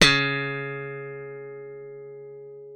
C#3, slap thumb; Xsample VOL-1

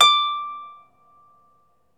Troubador Harp

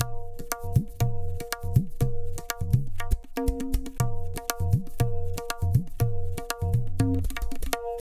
tabla, 120 bpm; Zero-G; Pure Tabla

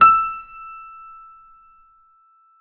PNO FF; Synclavier Sampler Library

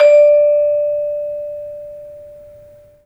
vibes; 3D Sound; 3D Keys VOL-1

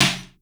snr, house; Crypton - DrumBase5000; HOUSE #1

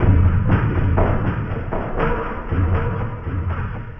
Elektro Brood, Drums; Sonic Foundry (Sony Creative Software); Friendly Fire

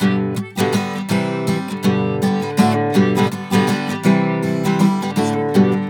GTR6, Celtic Flavours; USB Soundscan vol.42; AKAI

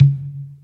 Lo Conga, Percussion Hits; Zero-G; Soundclash; Acidized Wavs

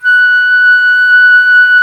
FLU, Flute

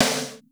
HARD S; Crypton - DrumBase5000; R70 KIT #2

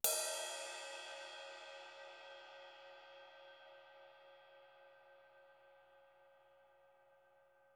MED RIDE, RIDES; Greytsounds VOL-1

